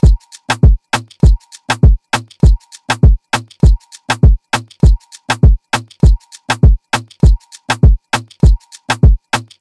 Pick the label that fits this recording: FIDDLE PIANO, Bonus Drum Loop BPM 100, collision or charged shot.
Bonus Drum Loop BPM 100